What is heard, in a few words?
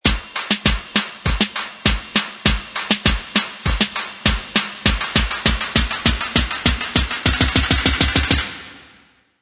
Buildup Drums 100 BPM; Cymatics